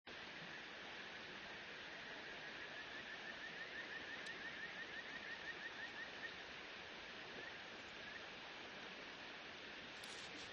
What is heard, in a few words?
riverside